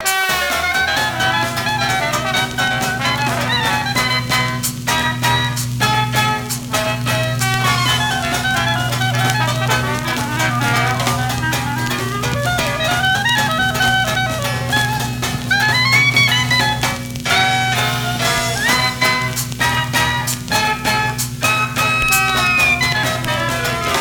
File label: Record Song